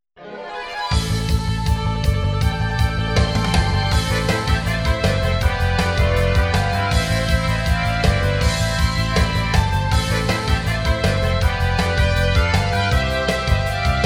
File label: battle